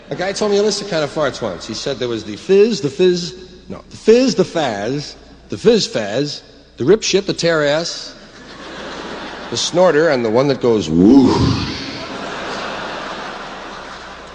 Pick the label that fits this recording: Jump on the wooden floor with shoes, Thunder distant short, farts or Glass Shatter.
farts